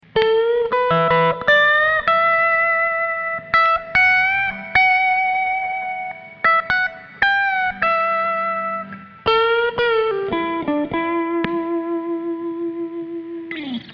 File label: solo licks